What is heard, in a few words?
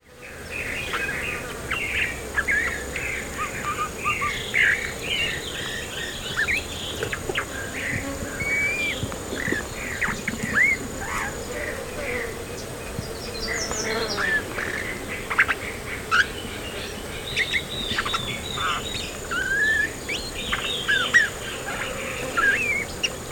Blue Mockingbird whisper song, Mimids